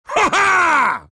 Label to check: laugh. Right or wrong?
Right